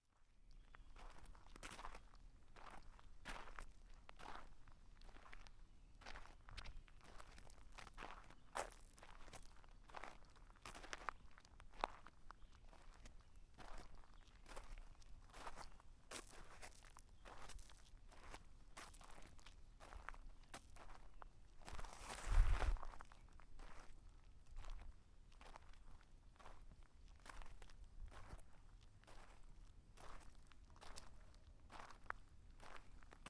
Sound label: walking on gravel path